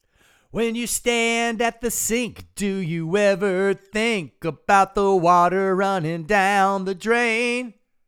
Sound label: vocals